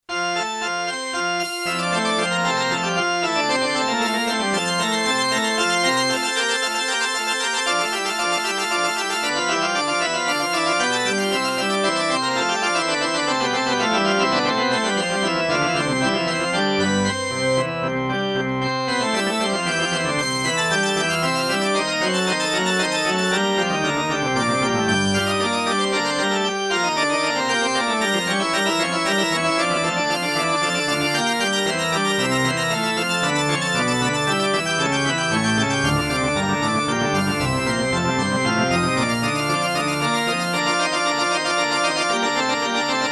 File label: pipe; pipe organ B; korg n1r